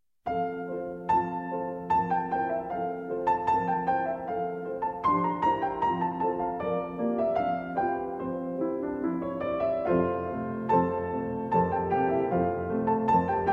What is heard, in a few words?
Piano Sonata in C Minor, 2 Pianos; No. 14; Arr. For 2 Pianos By Edvard Grieg